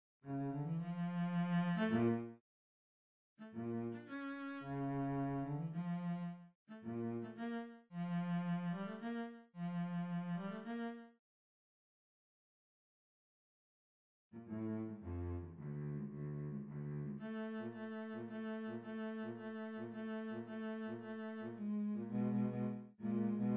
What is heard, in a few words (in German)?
Violoncello; Notenschlussel